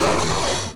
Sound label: Soldier Pain